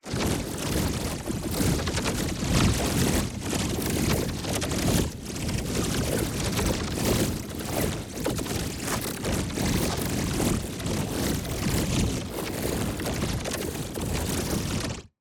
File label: ABILITY CHARGE Rubber Swarm; boom library; Matter